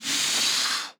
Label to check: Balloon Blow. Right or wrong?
Right